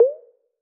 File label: Grab